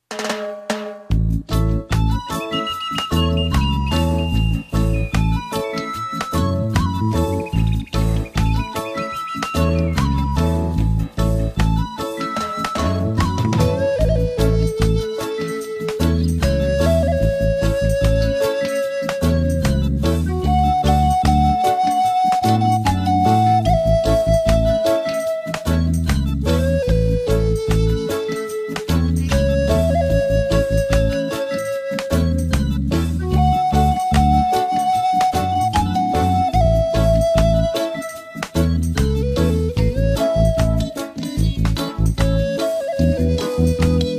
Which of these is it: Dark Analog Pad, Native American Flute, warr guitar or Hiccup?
Native American Flute